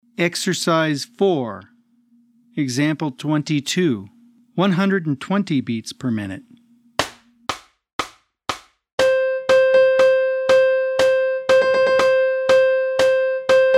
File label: 120 BPM